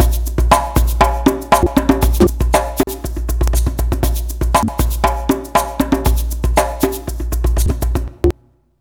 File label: Modern Percussion Loops, MPC60 119; Soundscan vol.56; AKAI